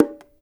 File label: BONGOS; InVision Interactive